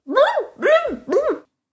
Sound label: drowning; daisy; sm64coopdx; char-select-extra-chars; [CS] Extra Characters